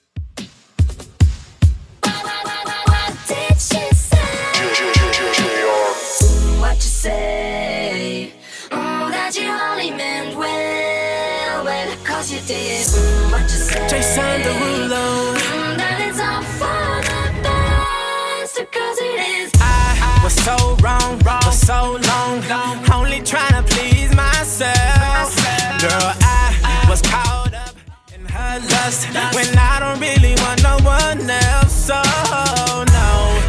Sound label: 72bpm